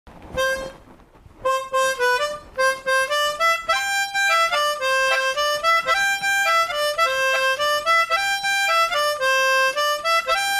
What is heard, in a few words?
Harmonica song